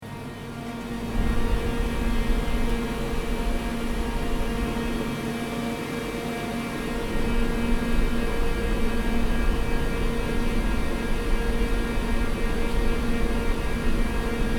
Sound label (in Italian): suono macchina elettroerosione; borgo sacco